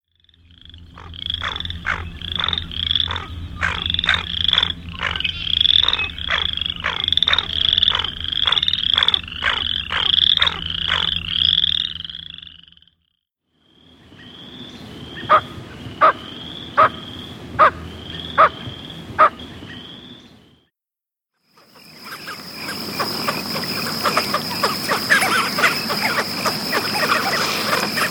Lesser Scaup

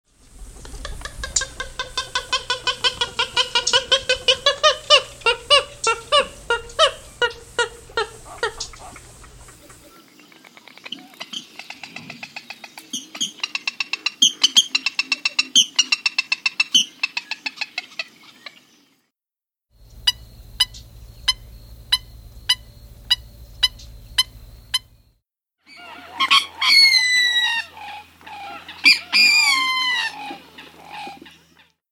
Purple Gallinule

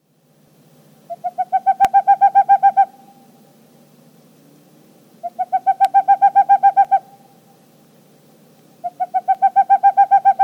Boreal Owl

